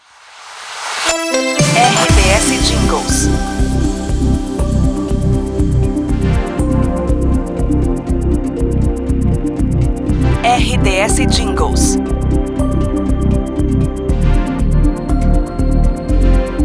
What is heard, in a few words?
trilha tempo; 012 News 2023